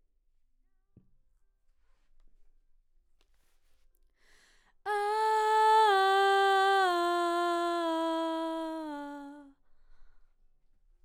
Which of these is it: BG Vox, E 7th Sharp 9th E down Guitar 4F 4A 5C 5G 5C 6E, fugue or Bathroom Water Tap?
BG Vox